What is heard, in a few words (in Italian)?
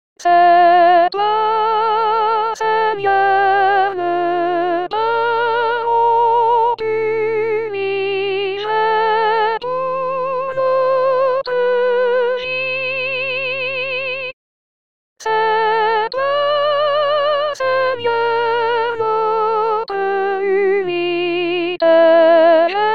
Sei tu Signore il pane C'est toi Seigneur soprani, voci guida; corali in vaticano 2018